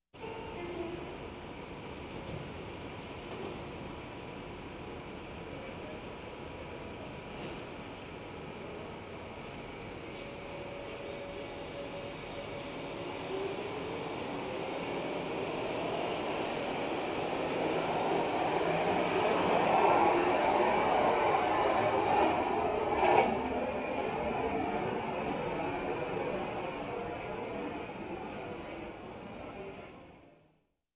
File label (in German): u-bahn (abfahrt); Großstadtgeraeusche